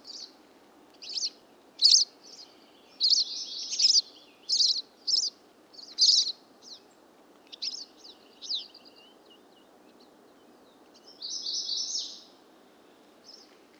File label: Pine Siskin Spinus pinus; mcqueen lake to isobel lake